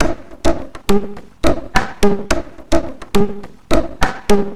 conga fishing, 105bpm; Zero-G; Freak Beats

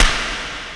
SD, Dance Drums

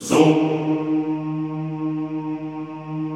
ZOOMMM, Extended Classical Choir; Best Service

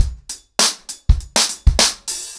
100BPM, ACOUSTIC DRUMS

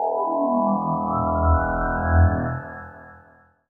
Gong Bong, Musical FX; Zero-G Smoke

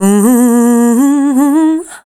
VOC F, Dance Vocals, FEMALE

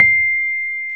PNO, Electrics; Club-50 - Foundations Roland; FRhodes2

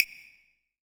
CASTANET; EdgeSounds; Drum Mashines; R-8 DRUMS

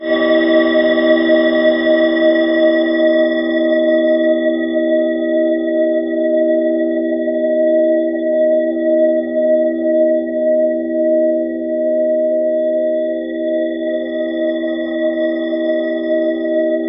Ominous Drones; USB Soundscan vol.48; AKAI

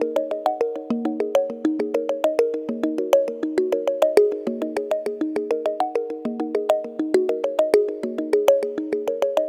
Tongue Mallet Echo; Melodic; Ambient-Melodics-II

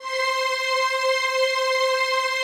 PAD, DIGI-PADS

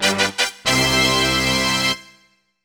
fanfare; AKAI S6000 CD-ROM - Volume 6

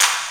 DECO CLAP; Crypton; DrumBase5000

